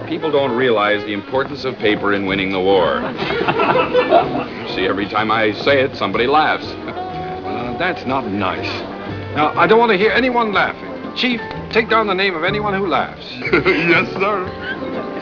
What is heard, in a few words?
Kiss Paper Laughs